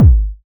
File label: round kick drum one shot g sharp key, kick drum single shot tuned to the G# note